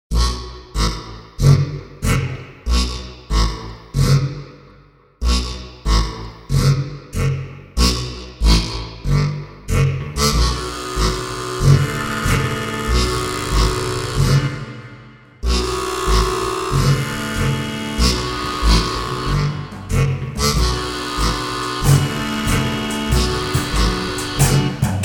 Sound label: bass harp